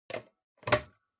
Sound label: Power Strip Gentle Plug In